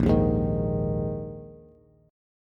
Bb 7th Suspended 4th, Bass; strummed; JGuitar

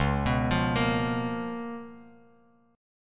C sharp 13th, Bass Standard; arpeggiated; C#13; JGuitar

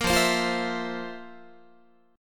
F Major 7th Suspended 4th F, Guitar; strummed

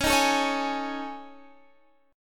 A Major 7th Flat 5th

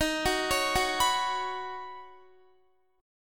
Eb Minor 7th Sharp 5th Eb Guitar; arpeggiated

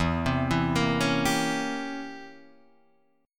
C 7th Flat 9th E

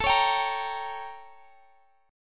A 7th Flat 9th Guitar Drop D; x,x,19,18,20,18

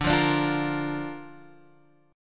D Minor Sharp 5th D down Guitar Open G

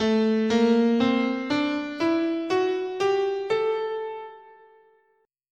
A Phrygian sharp6, scale

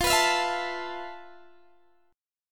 F Major 7th Flat 5th, F, down, Guitar, DADGAD

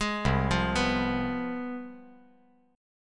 C Major 7th Suspended 4th Sharp 5th, down

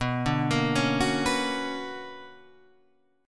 B Minor Major 9th B, chord

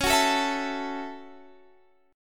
F sharp Minor 7th C sharp

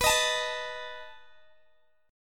B 7th B down Guitar Open G

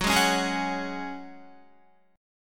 Gb Suspended 2nd, Guitar 4E,4A,5D,5G,5C,6F; Gbsus2 strummed; 14,11,11,13,13,13

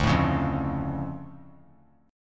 D Minor Major 7th, D; 14,16,16,15; DmM7 strummed; JGuitar

